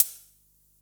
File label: Closed Hihats; Vengeance Samples; VEH3 Cymbals